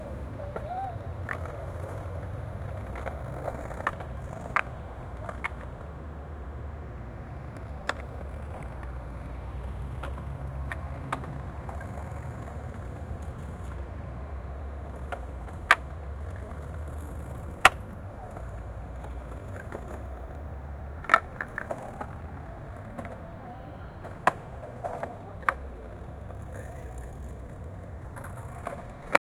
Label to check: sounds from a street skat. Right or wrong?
Right